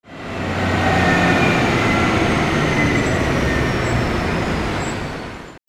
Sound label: VEHICLES